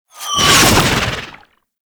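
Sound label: Pistol Alt Fire Projectile By; Warframe; Scaldra Pistol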